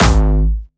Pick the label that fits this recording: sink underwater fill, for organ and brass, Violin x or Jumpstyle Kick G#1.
Jumpstyle Kick G#1